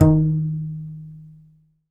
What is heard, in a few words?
C. Bass Balalaika, Plucked Strings; E-MU Producer Series Vol. 7 – Old World Instruments